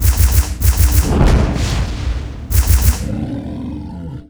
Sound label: Mid Shockwave